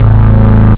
juno, synth bass

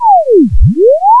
sine

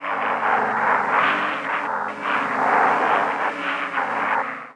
cybrid com; starsiege

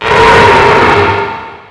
DEATH; QUAKE2

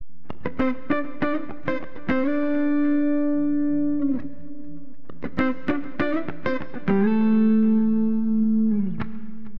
cool guitar; AcidJazz Funk; LiveAct Pool

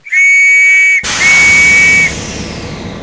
whistle

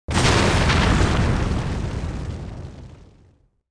UFO dead, disasters

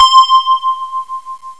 Bells; Echo 1.1

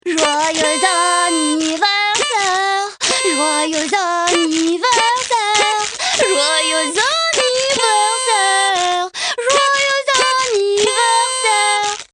APPLAU; MOBICLIC 72

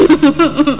f laugh

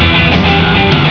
Garage riff

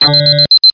MIS LOCK; XWINGTIE

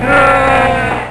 "Yay"; The Crypt

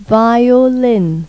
VIOLIN; Pipo-Aprende musica con Pipo

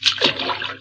DROWN; GryTygryska; ZABY

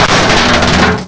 smash

